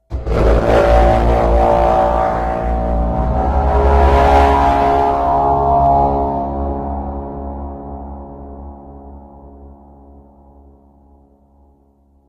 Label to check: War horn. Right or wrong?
Right